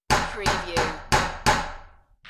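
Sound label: Door Knocker 5x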